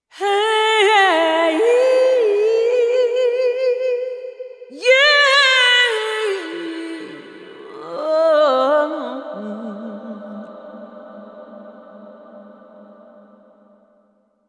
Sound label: Voices of Soul Vox Plate